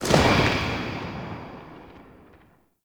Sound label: Infested Burst Rifle Tail, weapons